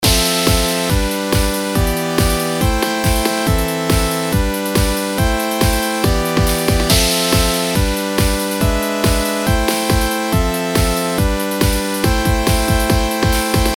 シンセ音作りサンプル素PAD

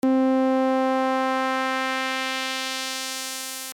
バンドパス

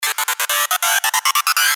四角波 FM フィル

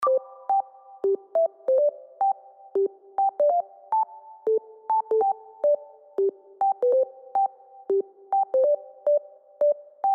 素サイン波無加工

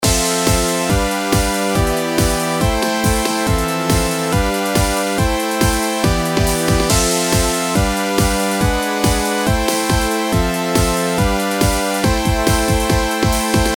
シンセ音作りサンプル素PAD

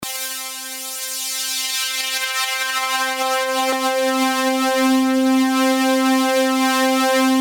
ハイパス徐々開け

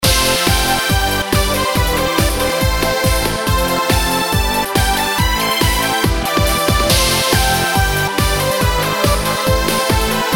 シンセ 音作り サンプル 素 PAD フェーザー有

